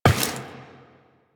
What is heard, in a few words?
Step Glass, Footsteps, Walk; Warframe; Tenno